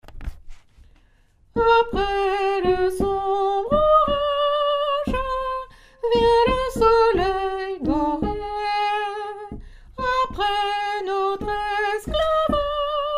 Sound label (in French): saltimbanques soprano; chorale